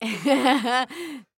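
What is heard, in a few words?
female laughter sounds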